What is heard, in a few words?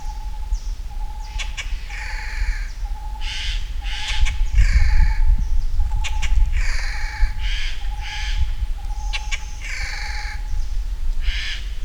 Garrulus glandarius; SETE